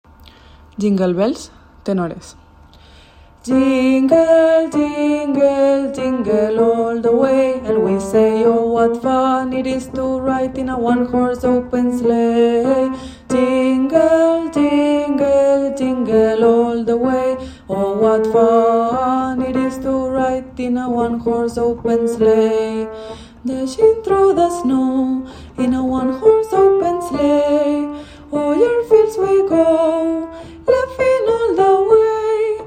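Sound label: tenor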